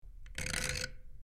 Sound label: Metal Dial Rusty Metal, switch clicks